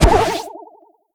leprechaun spawn; Crypt of the NecroDancer

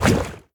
tar out; Crypt of the NecroDancer

